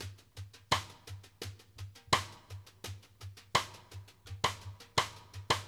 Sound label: BRUSH, BALLAD 84; Sampleheads; New York City Drumworks VOL-1; SP BALLAD 84